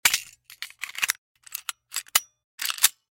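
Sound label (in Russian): быстрый профессиональный перезаряд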